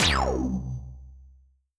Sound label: evil laser